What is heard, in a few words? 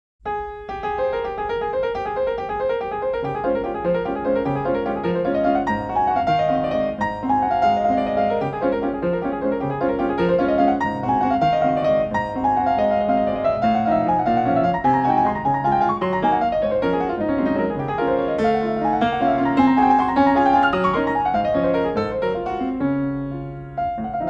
Waltz; Chopin; Minute Waltz